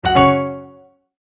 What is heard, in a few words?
multimedia tone start